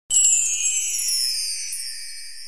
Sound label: cartoon chime; TECKNAT